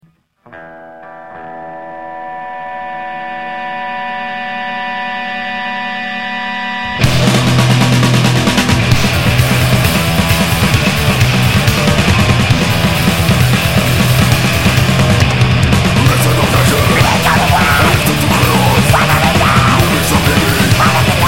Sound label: beat